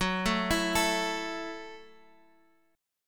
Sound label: F sharp Minor 7th, Guitar, Standard; arpeggiated; capo 2; x,9,7,9,10,x